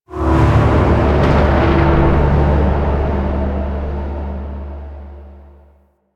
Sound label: Mood Change Stinger; Duviri